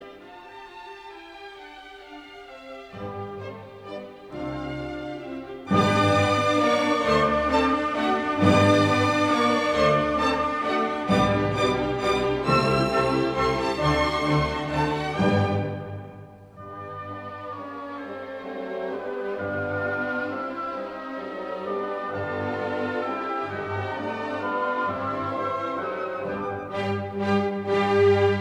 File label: Menuetto Allegretto Trio; Mozart; KV551